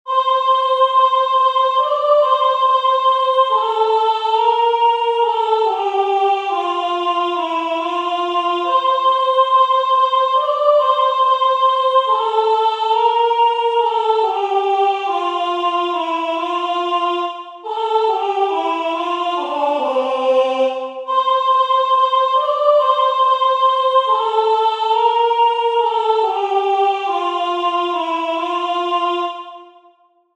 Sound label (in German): Es ist ein Ros entsprungen Soprano